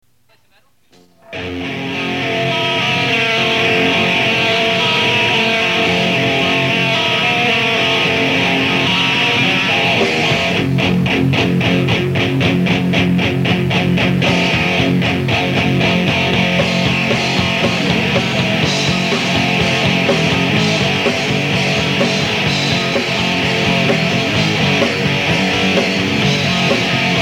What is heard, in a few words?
instrumental